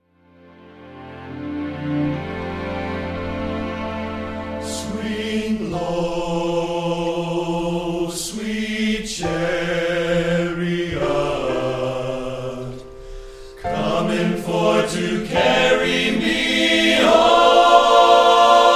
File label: Swing Low Sweet Chariot